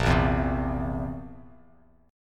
A sharp Suspended 2nd Flat 5th A sharp, Bass Standard; strummed; A#sus2b5; JGuitar